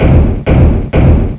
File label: stonefall